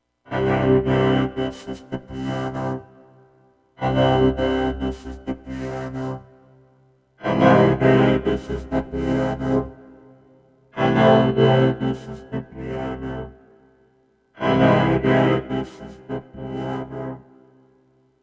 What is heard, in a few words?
piano voice, vocoder; analog synth